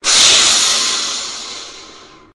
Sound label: croc dying; minecraft; technic